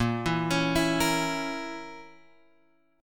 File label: A sharp Diminished, Guitar, Open G